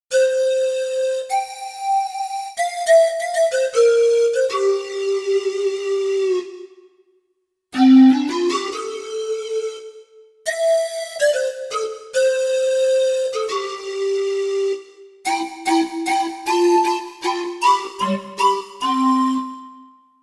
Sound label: Pan Pipe, Roland JV-880; Factory Patches